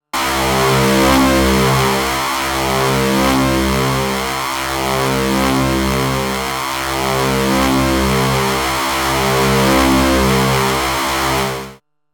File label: Waverazor Dual Oscillator X Vol; MOK